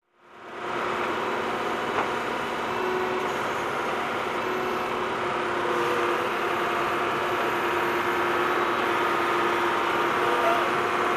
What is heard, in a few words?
Ambient Construction Noise